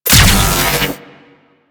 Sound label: Laser Ability; Ambulas; Warframe; Corpus